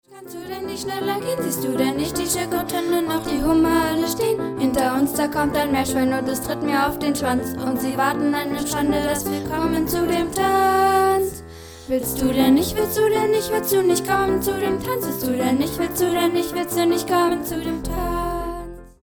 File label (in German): Das Lied der Maeuse; Alice alive